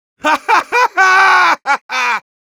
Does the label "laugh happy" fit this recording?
Yes